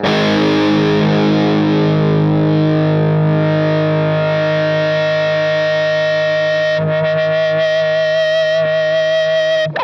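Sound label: fuzz guitar vibrato; MAYFUZZ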